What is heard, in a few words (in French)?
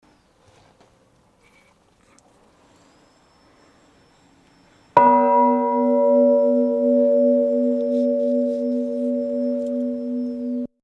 cloche; Quilen